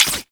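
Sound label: crossbow Mech; Warframe; Tenno; Primary Xbow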